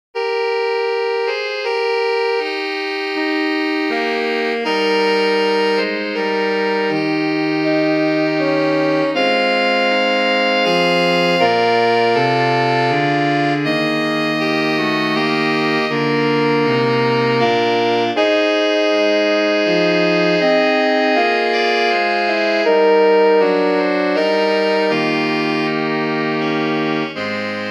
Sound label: Carol Sax